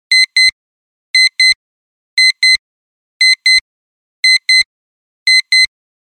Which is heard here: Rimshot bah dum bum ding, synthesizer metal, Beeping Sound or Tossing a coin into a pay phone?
Beeping Sound